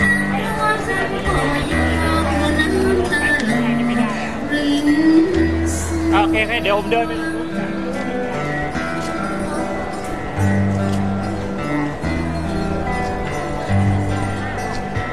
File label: mardi gras